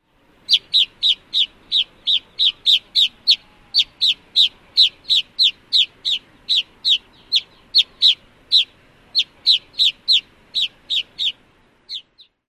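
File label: song, bird; AK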